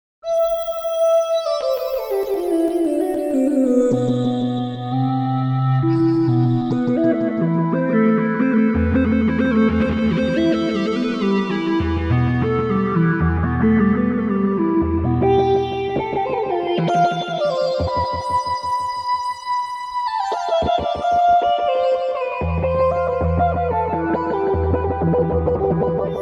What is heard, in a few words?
voycer lead